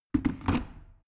plastic water boiler place

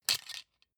plastic frame small hit rattle

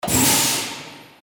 releasing air pressure

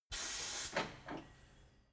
tire changing machine hydraulic separator short

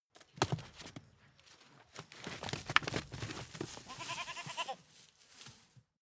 goat fight movement horns crash

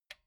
metal button stereo spring release

